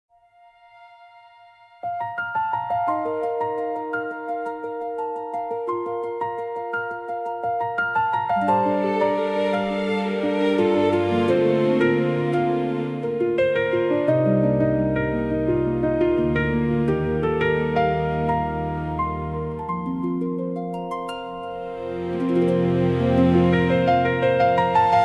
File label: elegant piano strings; guest intro